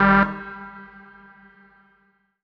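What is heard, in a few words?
Rave Synth, Dance Mega Jungle Rave; Best Service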